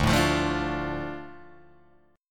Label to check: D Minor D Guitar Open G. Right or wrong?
Right